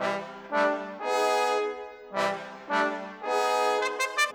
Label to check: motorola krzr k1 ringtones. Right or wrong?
Wrong